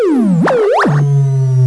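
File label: arcade game; HIGHTECH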